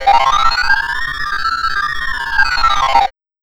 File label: NYC 125 FX, Big Beat Drumloops; AKAI; USB Soundscan vol.25